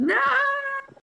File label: yahhhhhhhhhhhhhh